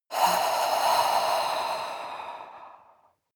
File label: breath female exhale